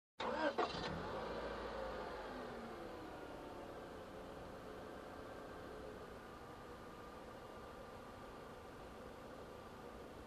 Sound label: Car approaching and parking nearby